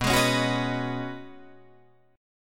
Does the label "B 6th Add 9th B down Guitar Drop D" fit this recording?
Yes